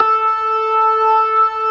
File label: TARK, organ combos; Keith Emerson Signature Series